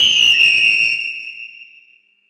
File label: Eagle Screech; Action / sky scenes; Bird Sound Effects